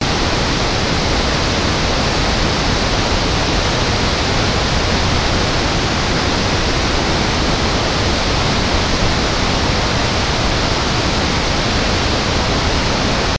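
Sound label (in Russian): звук большого водопада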